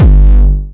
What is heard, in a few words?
Syn Dr, Overloaded Synthetic Drums; style kits